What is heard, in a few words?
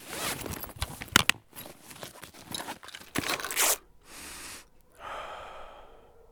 medkit use; Escape from Tarkov